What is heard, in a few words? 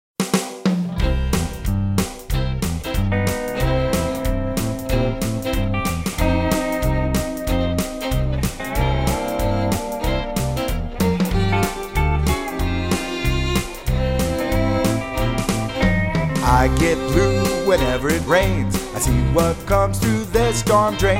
Storm Drain Blues; Miami County Park District